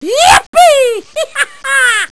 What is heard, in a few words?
yipee